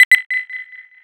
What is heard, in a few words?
cart explode trigger; Team Fortress 2